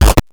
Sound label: grind, EMU XL-7